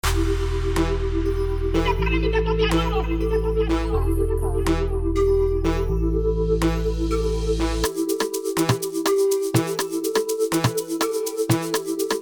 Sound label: Afrobeat street banger